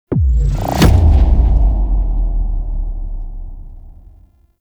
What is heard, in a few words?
Railjack Blackhole Ability Explode; Warframe; Vehicles; RailJack Powers